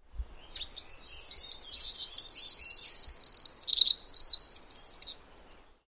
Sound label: wren